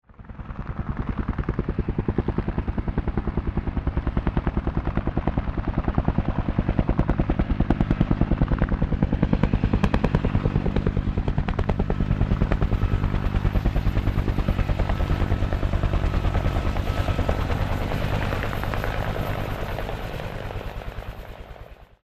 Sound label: bell